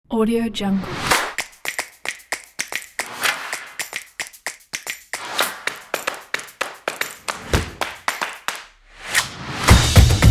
drums

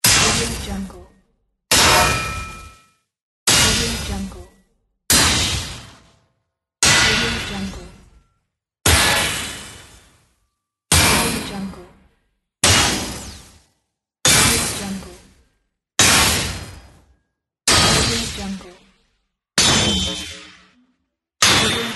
electric shield defense

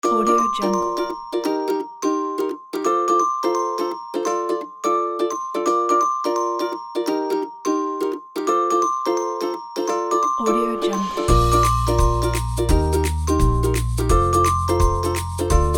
happy playful mood; audiosky

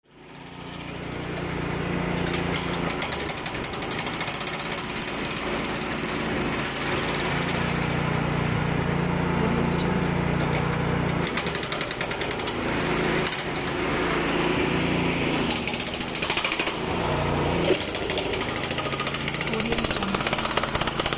pneumatic drill street work

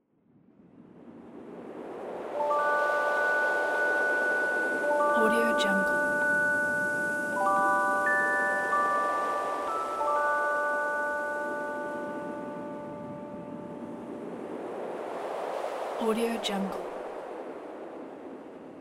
christmas here; icesound

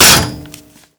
utilities metal layer enhancer

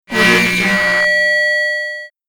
feedback high pitched noise

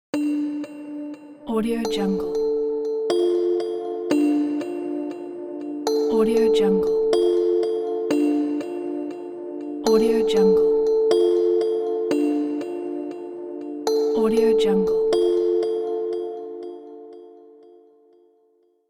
relax calling

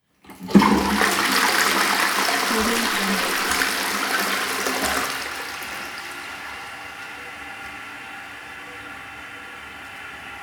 flushing toilet

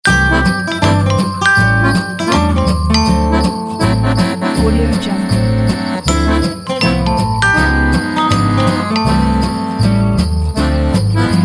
christmas glockenspiel swing

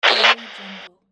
short radio static blip

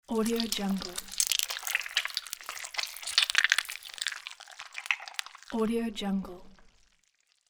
gross slime goo; floraphonic

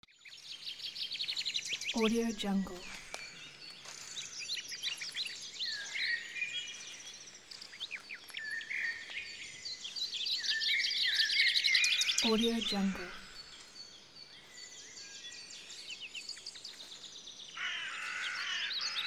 walking in forest

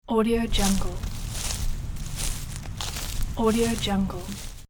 walk on grass

